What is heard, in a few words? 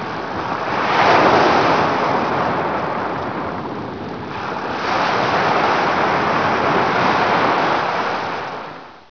beach, ocean sounds